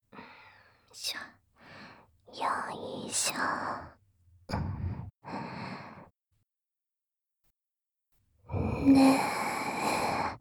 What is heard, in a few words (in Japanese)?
耳舐め フェラ 乳首舐め 効果音なし; 発情期マタタビでふにゃふにゃドスケベご奉仕